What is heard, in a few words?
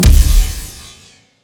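Laser gun Flange Cannon Fire; Lasrgun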